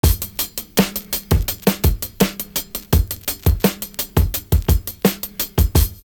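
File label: basic 16 hi-hat, loop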